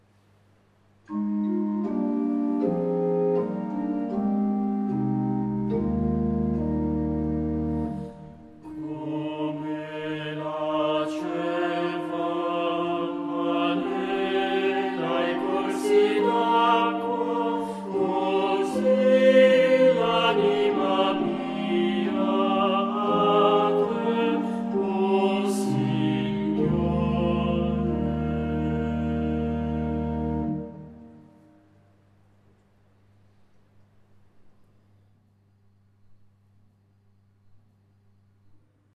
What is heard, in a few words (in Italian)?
Sabato Veglia Canto dopo la VI lettura